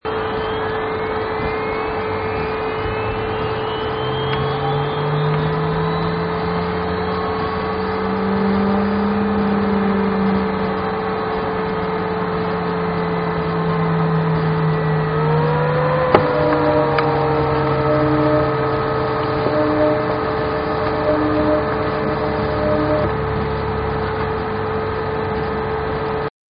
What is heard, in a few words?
laser scanner error